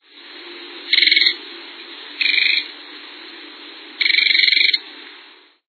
marianinha-amarela

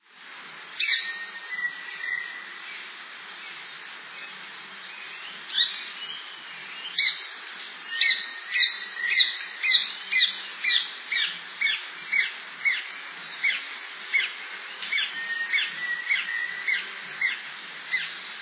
ariramba-da-copa

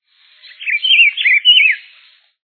pitiguari

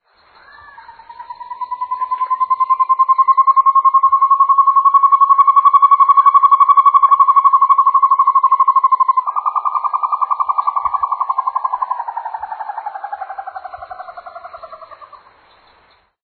tovaca-campainha

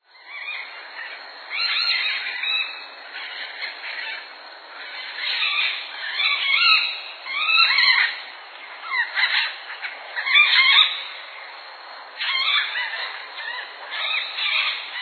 maracana-de-cara-amarela

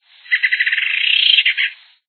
chororo-de-asa-vermelha

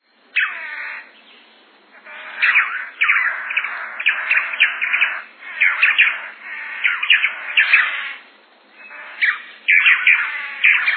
tangara

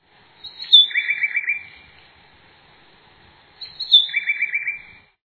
uirapuru-de-peito-branco

